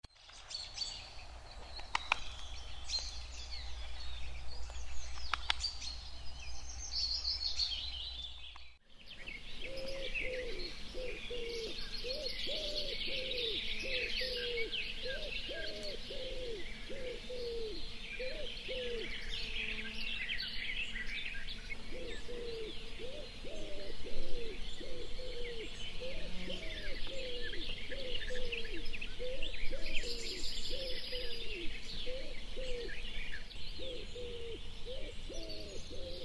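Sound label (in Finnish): sepelkyyhky, lintuäänet; Monitavuinen kumea kuherrus ”kuu-kuu-ku-kuu”; Peltoalueet ja niiden reunametsät. Kaupunkien puistot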